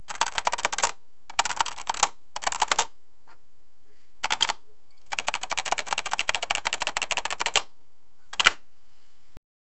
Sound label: toy keyboard; musical instruments